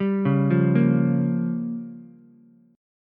Bb Minor 6th, Ukulele; arpeggiated; JGuitar

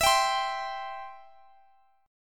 E Minor Sharp 5th, Guitar, Standard; Em#5 strummed; x,x,x,21,20,20; JGuitar

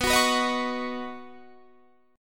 B Major Add 9th, B, down, Guitar Standard; Badd9; strummed; JGuitar

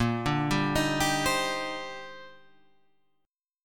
D sharp Major 13th, A sharp, guitar chord

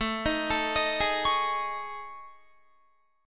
A Major 7th Flat 5th, down Guitar; AM7b5; arpeggiated

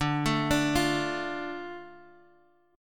D Minor D Guitar Half Step Down

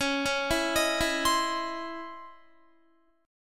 C sharp Minor Major 9th, Guitar; C#mM9; arpeggiated; JGuitar

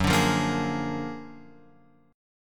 Gb Augmented Major 9th Guitar Open F; Gb+M9 strummed; JGuitar

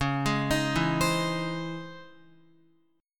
C sharp Minor Major 9th, Guitar; C#mM9; arpeggiated; JGuitar

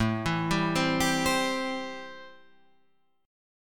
A-Minor 7th A; 5,7,5,5,8,8; Am7 arpeggiated; JGuitar

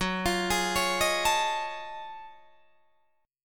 F sharp Minor Major 13th, Guitar DADGAD; chordshape; down

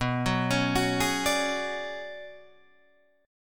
B Minor 6th Add 9th B, Guitar DADGAD

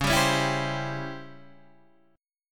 C sharp Minor Major 7th Flat 5th, Guitar, Open D

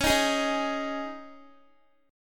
Db Suspended 2nd Guitar 4F,4A,5C,5G,5C,6E; strummed; x,16,15,13,15,x

